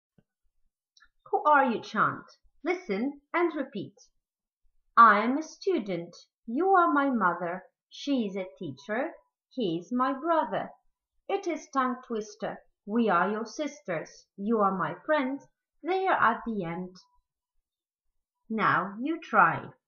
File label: chant